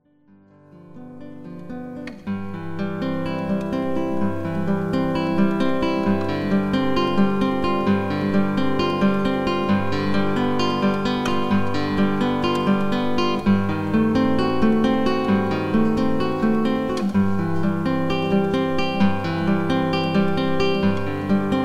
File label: Ave Maria, Solo Guitar; J. S. Bach - Prelude in C Maj